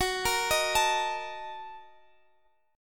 Bb Major 7th Suspended 4th Sharp 5th, Gb, chord

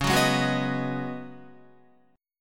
C sharp 9th Suspended 4th, C sharp, down, Guitar; strummed; chord

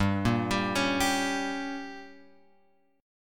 G Diminished; Gdim Chord {3 1 X 0 2 3} arpeggiated

